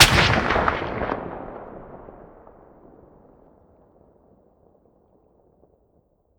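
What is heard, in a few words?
weapon sniper sup fire player atmo ext; gmod; viper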